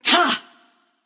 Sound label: grunt attack passion; chaac